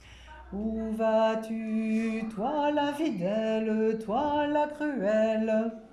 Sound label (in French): refrain basses; Groupe Vocal